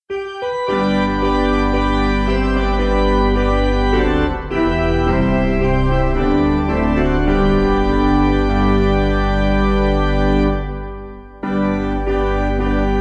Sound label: O Piano